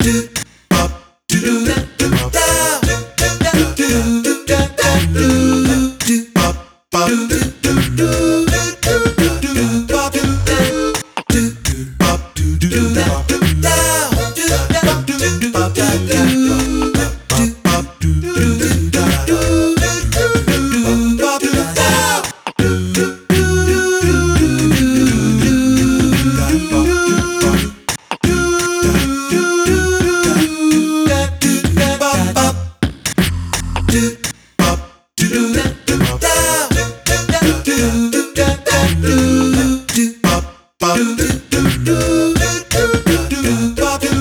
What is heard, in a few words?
Credits Acapella; Return All Robots